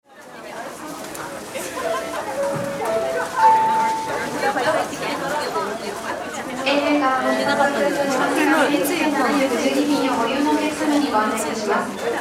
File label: airport in japan